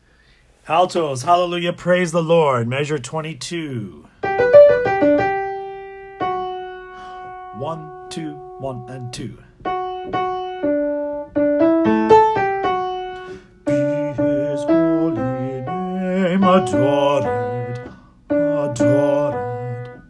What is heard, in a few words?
Alto Hallelujah